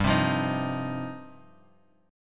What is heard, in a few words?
G 7th Suspended 4th Sharp 5th, Bass Standard